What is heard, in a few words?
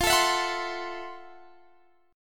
F Major 7th Flat 9th, F, guitar; strummed; JGuitar